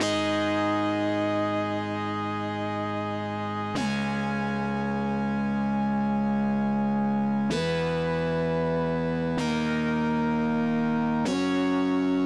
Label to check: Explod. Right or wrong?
Wrong